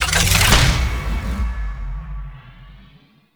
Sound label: Landscape Capture Console Success; Gameplay; Warframe; Venus Open World